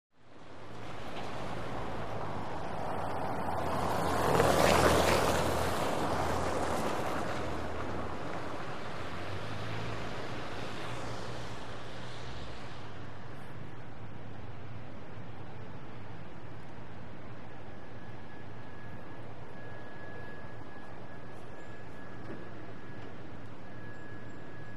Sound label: Bys On Wet Cobblestone Street, Slow To Medium Speed. Defined Cobblestones And Tires Through Puddles. Quiet Day Ambience. Medium Perspective Car Start.; wet traffic